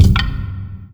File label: fs fm lightbridge, footsteps; player; Portal 2